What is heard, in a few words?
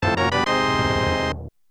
item get